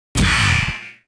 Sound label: Activity Lose; Arcade